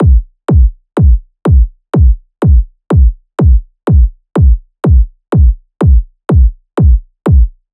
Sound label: kick 124, Kick Loops; drum sample pack; Acamarachi; SEISMIC; Slate